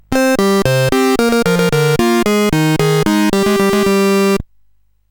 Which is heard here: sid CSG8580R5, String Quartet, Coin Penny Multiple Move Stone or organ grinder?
sid CSG8580R5